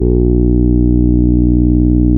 TAURUS C3, synthesizer

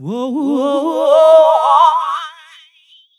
OHOUO VOX, Funky Vocals, ADLIB MEN; USB Soundscan vol.18; AKAI

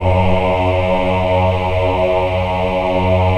VIB F#2, Symphony of Voices, VIBRATO; Spectrasonics

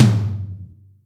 Room, Acoustic Tom; Yamaha DTXpress

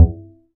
Upright Acoustic Bass, E STRING; Larry Seyer

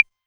808 Clave; Novation Drumstation

